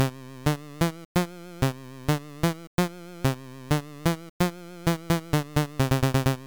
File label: LFO